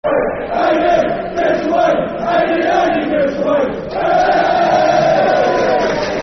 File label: Aidy Give Us A Wave Football Chant Soccer Song; Watford Wfc